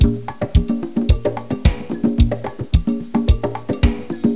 Samba Sound

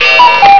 Cuckoo Clock

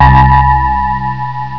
GONG; psion cd vol1

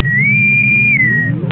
Enterprise Bridge

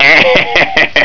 LAUGH; psion cd vol1

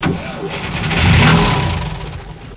Engine Start; psion cd vol1

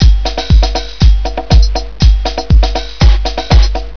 Drum Play; psion cd vol1

